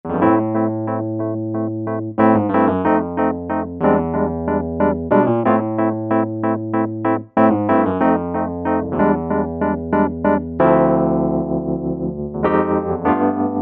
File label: Pure Wurly